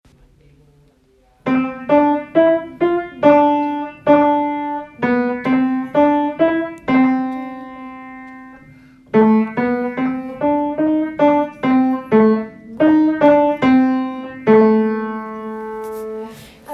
Blues; Home Recorded

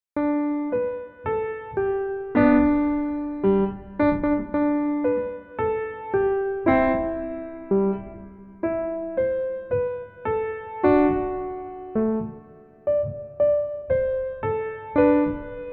Jingle Bells

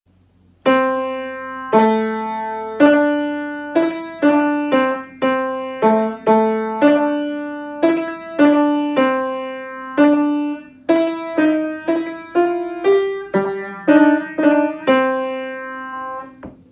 Jazz Walk; Recital

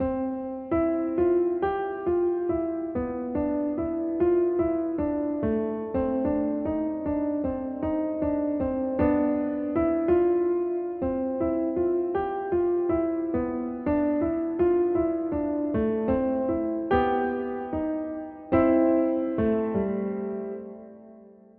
waltz

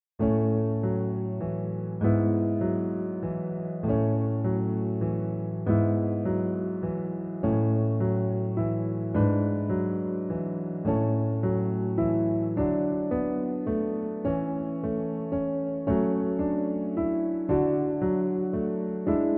Piano Only A Major; The Sound Of Music

